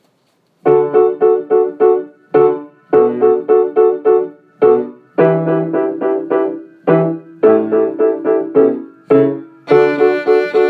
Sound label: D String Concerto